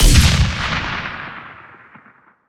Sci-Fi Weapons-Sniper Rifle Single Shot

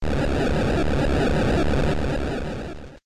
Earthquake; PRSFX- Earthquake; Pokemon Reborn

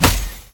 grapple connect; Scrat

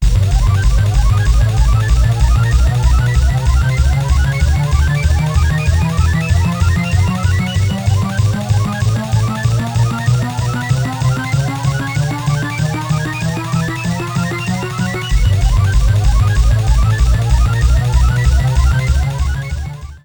Super Jackpot Lit, pinball; The X-Files Pinball

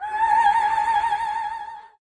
Spooky Voice Stab; OldMan AlienChase

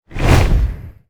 Dungeons Change Level; mighty party 2017 gamerip pc ios android

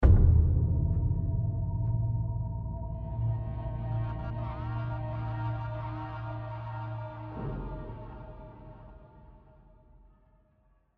Tension; AC Tension 4 Sudden Death

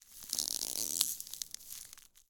Ice Cliffs, ice

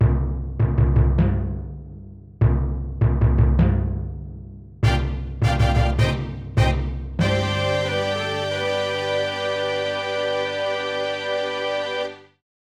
Temple Chamber Completed; Advanced Dungeons & Dragons; Deathkeep; 3DO

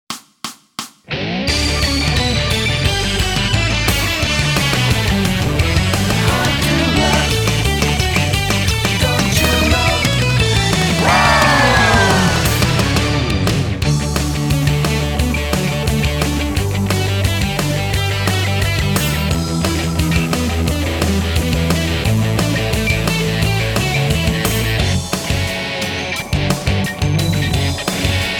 Vocal Minus Track

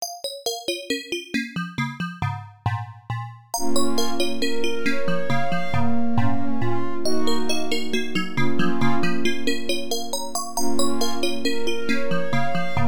Boot Music; Whale's Voyage; IBM PC AT

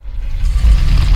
Door Heavy Stone Begin

